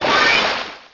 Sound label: Sparkling Aria; battle anims; PokeRogue